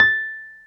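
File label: piano, Wurly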